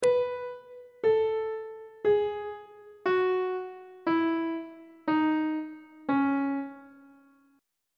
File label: d flat aeolian mode descending on treble clef